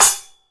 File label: Hi Crash Choke